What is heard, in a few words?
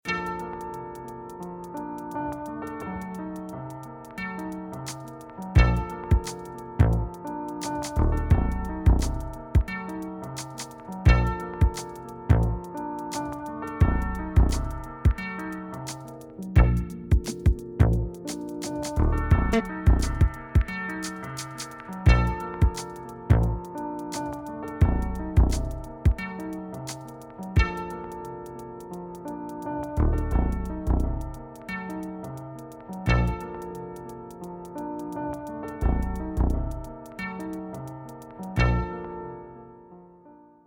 Loop